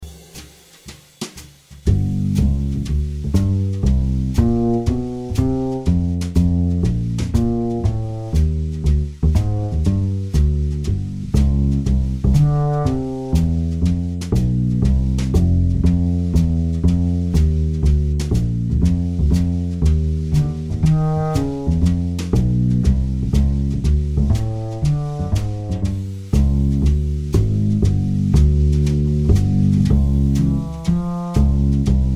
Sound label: Backing, jazz